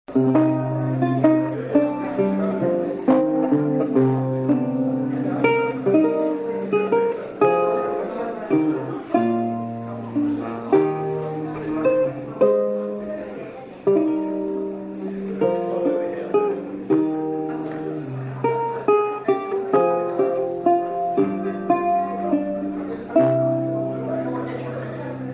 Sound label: lute music